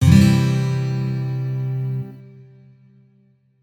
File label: Stacked Whole Notes